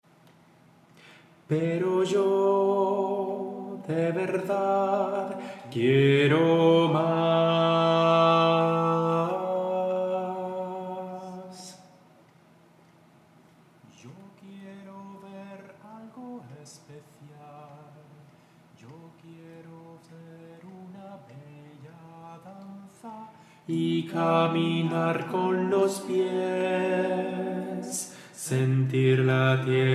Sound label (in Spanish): alto; LASIRENITA